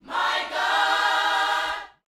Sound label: Vocal, Gospel, PHRASE; Spectrasonics